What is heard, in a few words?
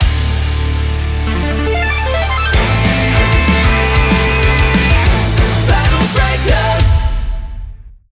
Battle Intro Stinger Shorter